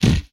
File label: Impact, retro arcade; MMRetroArcadeSoundsPack